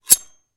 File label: Knife Draws, knives